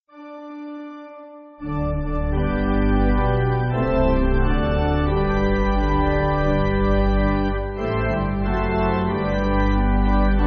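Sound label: Pipe Organ; 1/G Lord's Prayer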